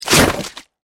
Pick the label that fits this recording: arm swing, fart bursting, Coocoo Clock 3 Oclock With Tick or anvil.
arm swing